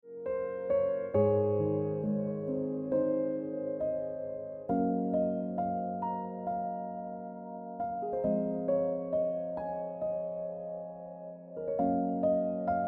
Ringtone Piano Music

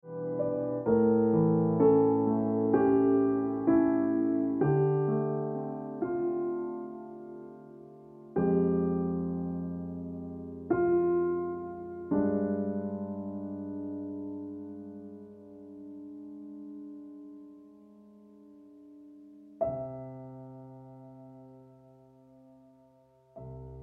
Dancing Trees Ringtone Piano Music

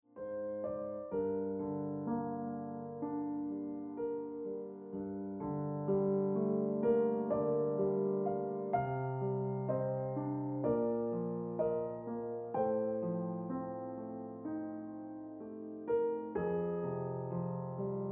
Ringtone Piano Music

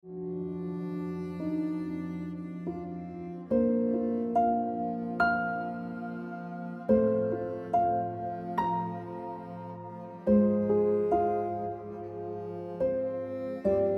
Jade Ringtone Piano Music

